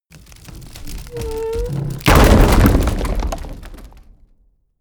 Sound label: wood medium crash; industrie wirtschaft; baugewerbe